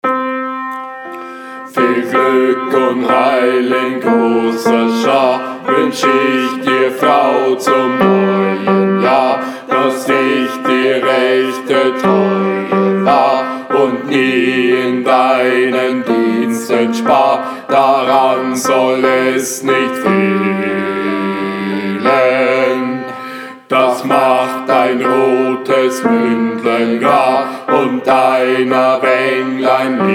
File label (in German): Bass; Gesang; Viel glueck und viel heil; Liedgut